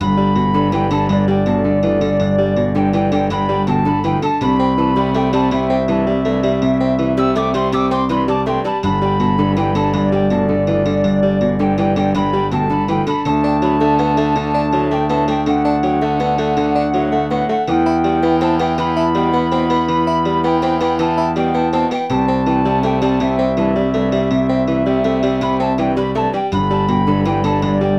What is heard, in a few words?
Pirate Ship; ultima runes of virtue ii 1994 snes